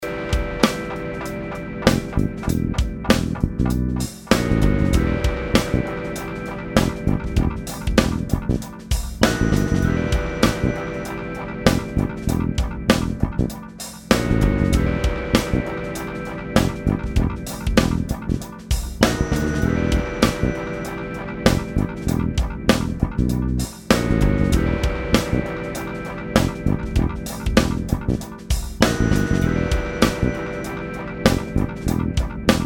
bass loop

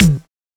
kick, Dembow Kick Sound; Reggaeton

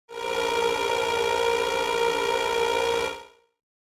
noise sound

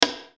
click

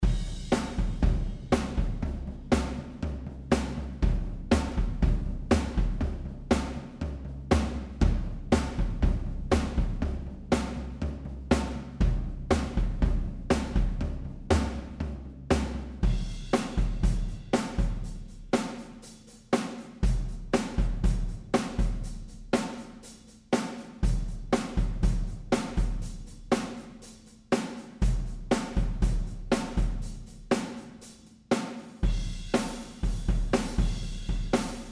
full bleed ludwig

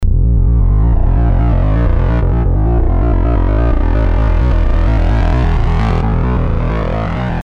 bass

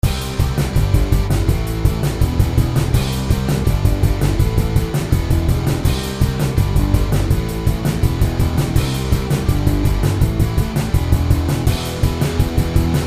overdrive

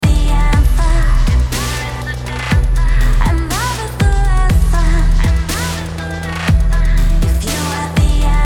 chorus mix

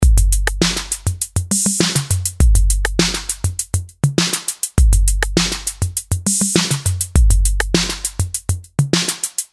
delay; 808

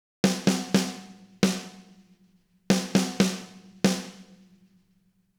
dingbingdong, snare

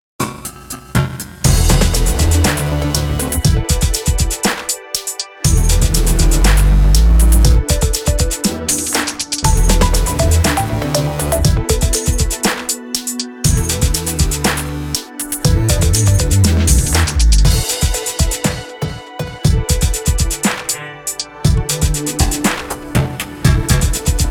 chill step

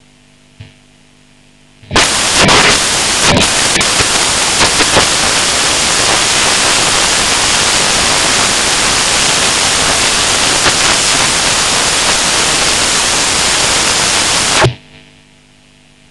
crash

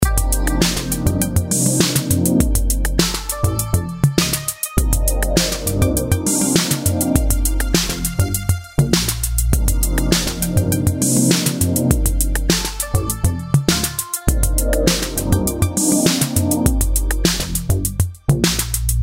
delay; 808

